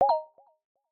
Magic Blip; Samsung; Galaxy A56

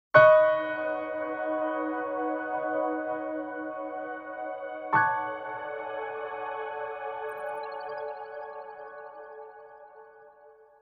Nokia Tones; Windows Phone apps

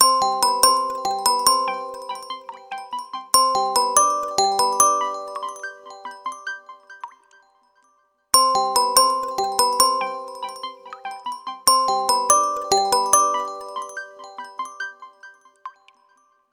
Bell Drop; ZTE Axon 20 5G; alarms

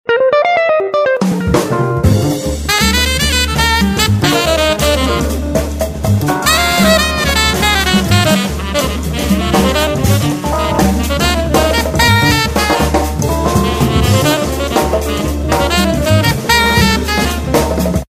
Jazz bar; Samsung GT-S7330-India

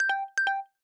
battery, ui; Lenovo; Vibe Shot

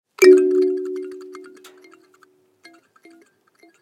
Tiger eye, digital; Nokia; Oro Belle

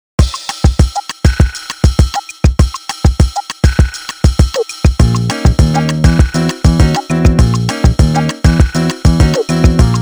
latin flava; Nokia; Markus Castrén; Sizzlin'

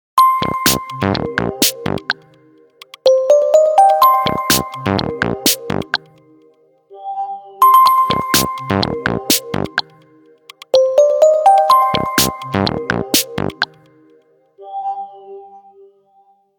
Tick, Nokia tones digital; N78

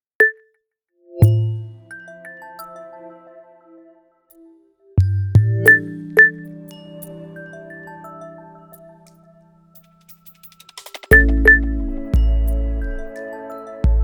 Nokia Alarm Clock; X100; alarms

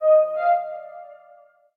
Tubular, notifications; ZTE V811

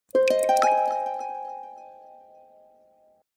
Dawn, notifications; Tecno Spark 40C

